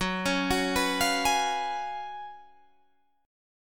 F sharp Major 7th Suspended 2nd Suspended 4th, down, Guitar, Drop D